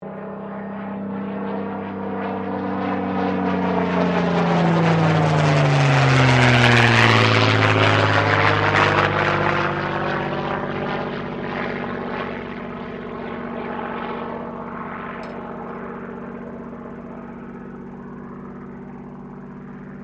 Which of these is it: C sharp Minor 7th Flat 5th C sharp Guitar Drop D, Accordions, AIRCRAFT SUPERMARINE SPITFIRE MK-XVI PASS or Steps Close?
AIRCRAFT SUPERMARINE SPITFIRE MK-XVI PASS